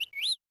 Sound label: Bird; Yamaha DTXpress-II